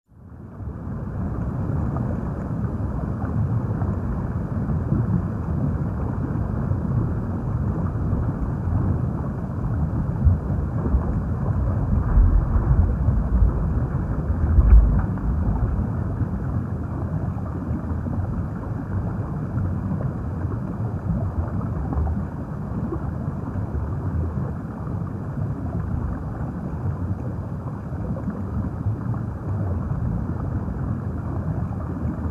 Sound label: Under water